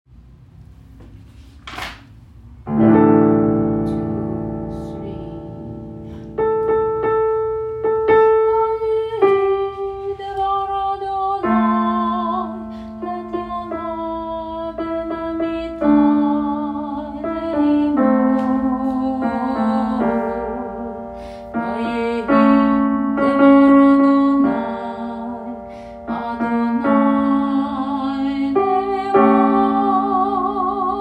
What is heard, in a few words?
Alto; Chapter 1 mm 7-16; Shirei Chesed Fall 2025 Recordings